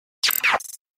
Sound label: glitch